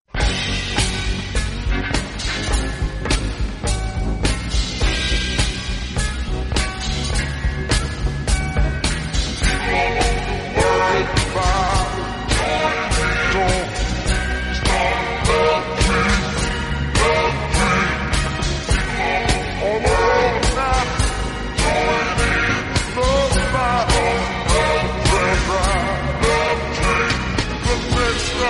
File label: vaporwave